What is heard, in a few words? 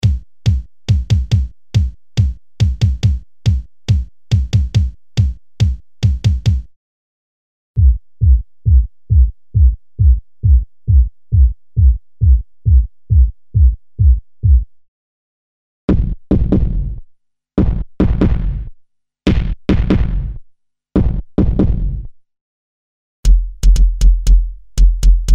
Drums and Percussion, Kurzweil K2xxx; K-Works